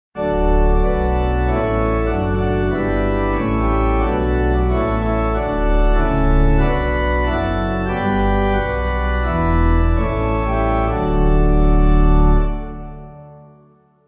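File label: Responses, pipe organ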